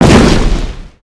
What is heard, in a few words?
rock spawn; creatures organic